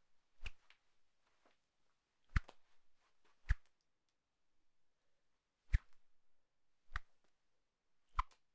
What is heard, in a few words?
Whip